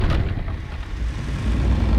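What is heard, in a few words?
Music Volcano 120; from dust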